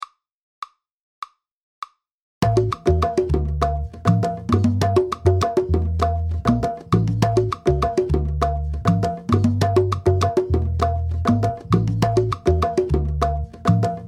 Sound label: Salsa play along track